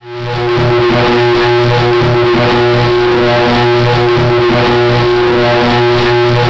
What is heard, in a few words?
Electrical Distortion; Alert Sounds